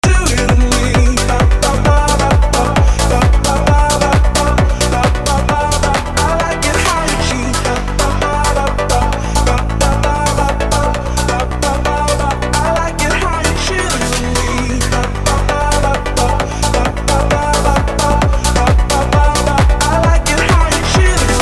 Avenger 2 Chart Pop Bap; Vengeance Sound; Factory 2

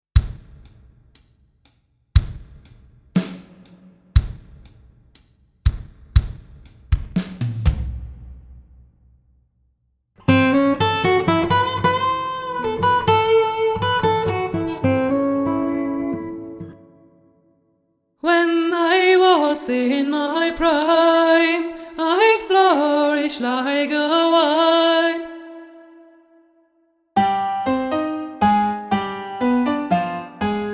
RMX16 Hall

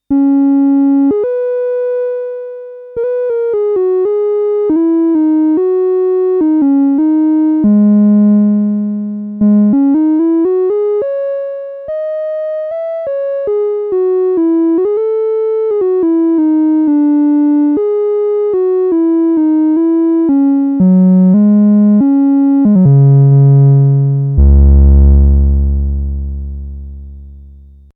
Saw Cutoff 30 Reso 0

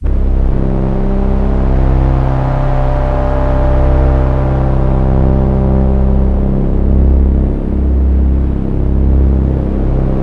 Behringer Neutron Dark Dune Drone

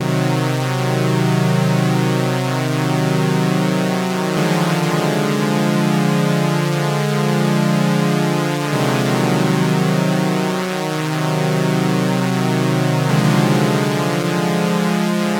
OB6 P6 Waveform Saw

